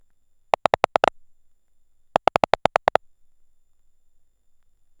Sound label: the old landline phone ra, vintage